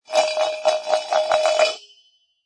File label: Pot Lid Spin, Metal pot lid or paint lid, spin on surface; Metal pot lid or paint lid, spin on surface and stop.; Spinning Sound Effects